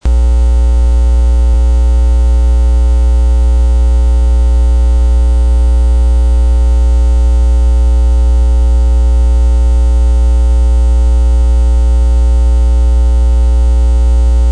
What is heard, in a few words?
ping